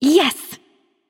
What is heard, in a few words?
yay light; smite; voicepack; hel